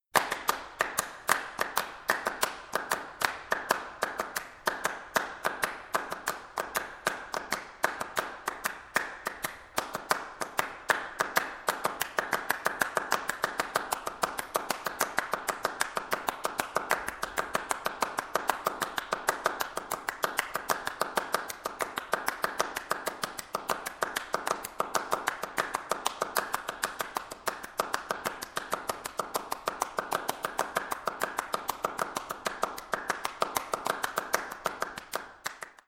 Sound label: Clapping Music